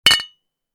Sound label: Bottle beer cheers; lifestyle